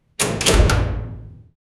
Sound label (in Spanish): puerta metalica cerrndose